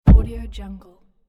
cinematic falling body

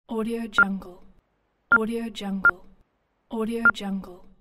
shortwave morse code

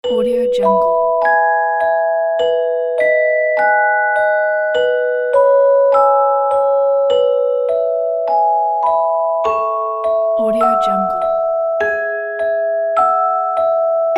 sweet lullaby; gga music

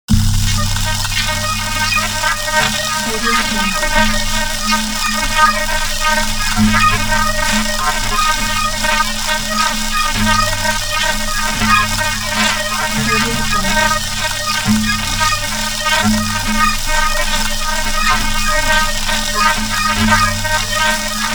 atmo abstract crazy didgeridoo high; smartsoundfx

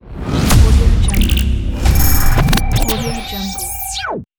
glitch logo

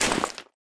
organic inventory discard; war ui; esmteam

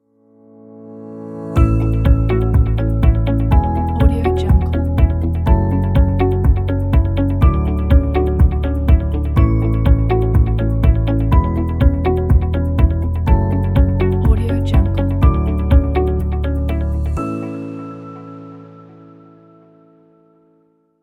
a corporate logo

